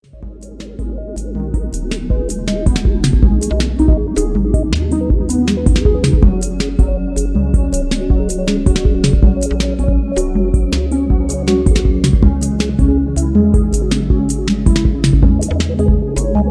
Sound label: Ambiental Loop